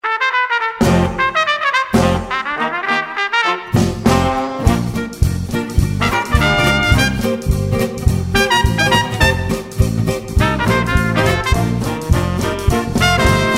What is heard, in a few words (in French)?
Ambiance 1900, charleston, playbacks, Accordéoniste